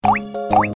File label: Drip drop